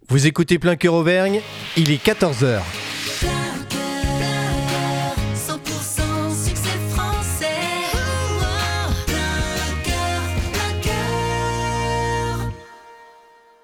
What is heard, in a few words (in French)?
TOP HORAIRE NEUTRE; PLEIN COEUR; MOULINS